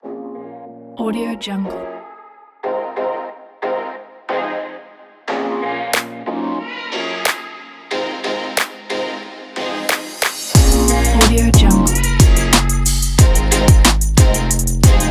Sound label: modern hip hop upbeat